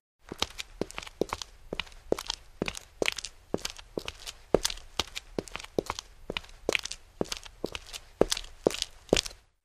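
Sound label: Footsteps Female Run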